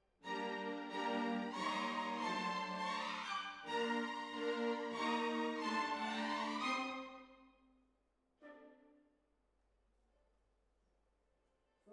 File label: Hall, Jazz for piano